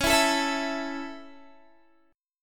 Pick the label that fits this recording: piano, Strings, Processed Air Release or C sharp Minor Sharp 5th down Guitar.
C sharp Minor Sharp 5th down Guitar